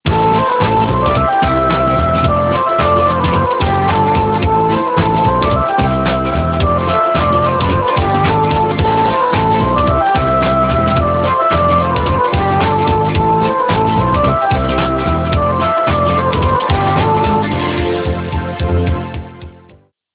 ringtone flute funk

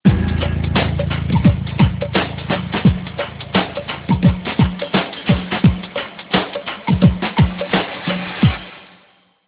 ringtone pop shuffle drum

